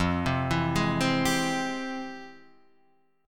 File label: F Minor Major 9th Guitar Half Step Down